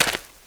Player Footstep Dirt

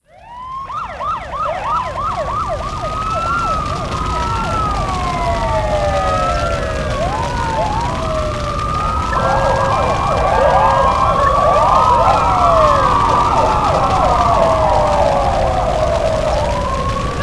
police ambience

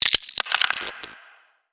click; gonarch

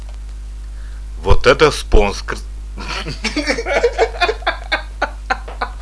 grunt

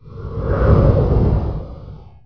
wind gust

